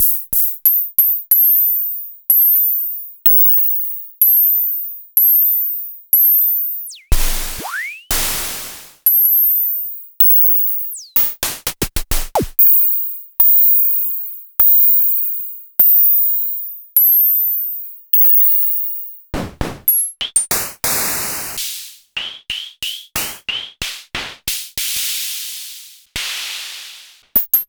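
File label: Klone Dual Percussion Synthesiser hi hat; KLONE HHAT NW8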